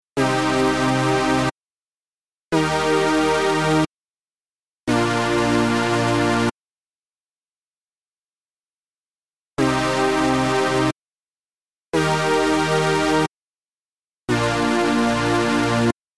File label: Synth Brasstard; Bitwig Studioにヴォコーダープラグインが追加